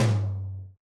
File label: RX8 Tom, Yamaha RX-8